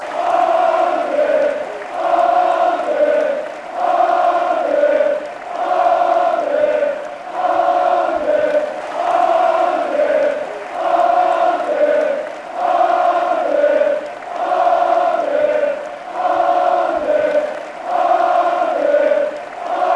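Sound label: athletic chant, football songs and soccer chants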